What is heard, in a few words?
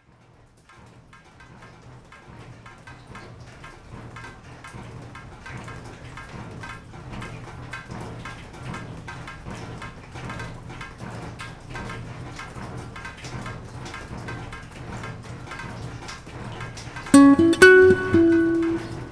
Rain Melody